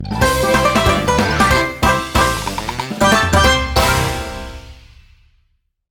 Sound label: Series Victory Theme; Banjo Kazooie